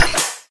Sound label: laser beam end; immersive sounds; fight; Factorio Paranoidal mod